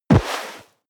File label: body fall leather snow